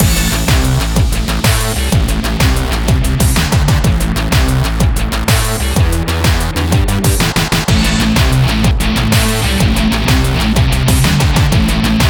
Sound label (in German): soundlogo musikbett; rallye tv